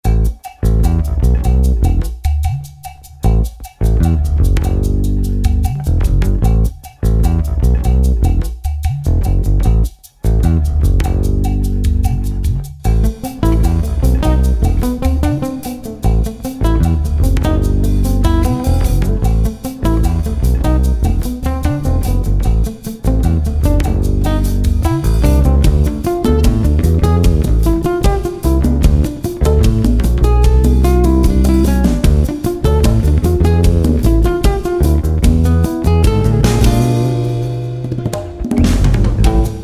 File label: rubber bass; Vibey, Quirky; Latin; Jane the Virgin